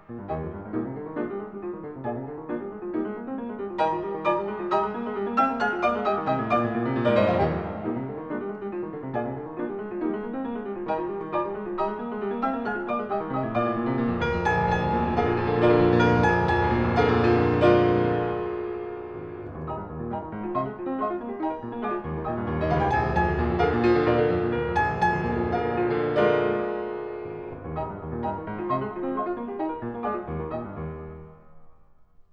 Variations and fugue for piano in E flat major; "Prometheus"; "Eroica"; Beethoven

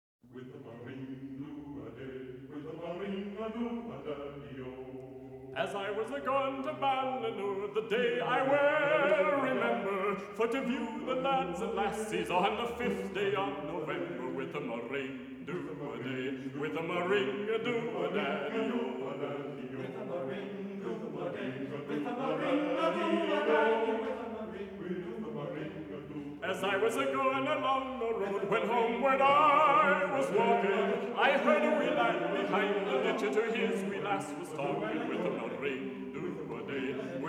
Ballad, Irish Folk Songs; A Ballynure Ballad

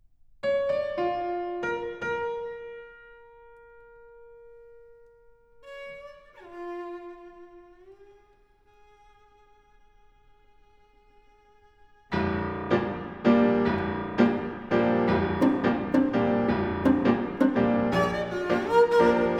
Three Preludes, Allegro ben ritmato e deciso; George Gershwin

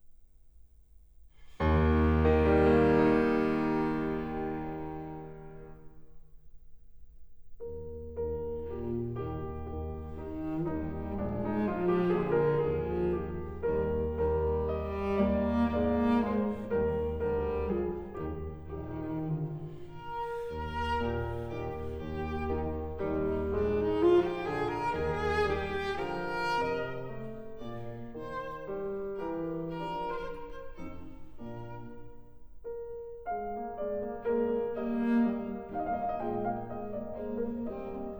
Variations for cello & piano in E flat major

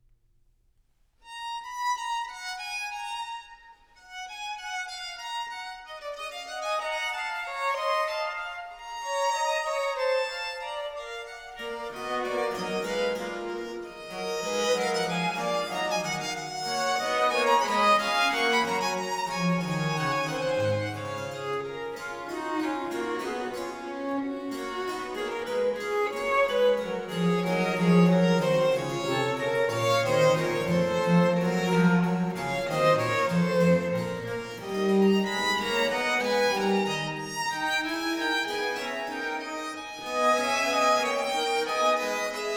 Sonata in E minor, Allegro, Trio Sonatas; Handel

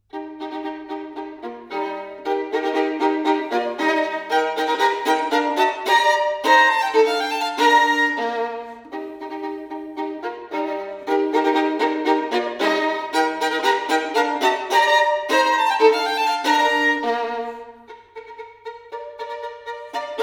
Caprices for solo violin, E-Flat Major; Capriccio No. 14; Paganini; Caprices (24)

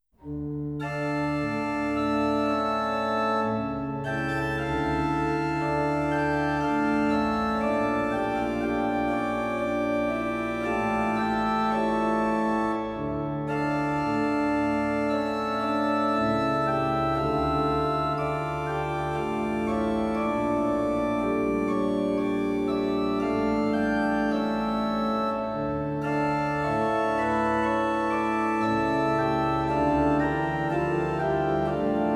Organ (Stereo); Denon Audio Technical CD

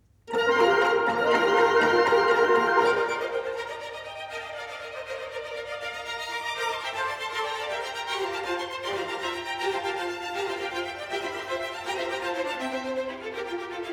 suite for orchestra, Tarantella; Pulcinella; Stravinsky

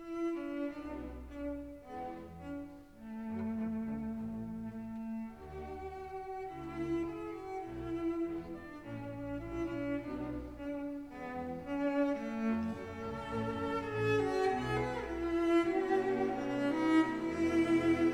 for Cello and Orchestra; Tchaikovsky; Variations on a Roccoco Theme; 2． Tema． Moderato semplice

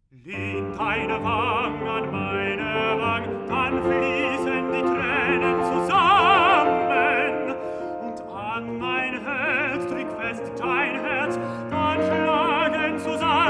Songs for voice & piano; Songs for voice & piano, Op. 142; Lehn deine Wang'; Schumann

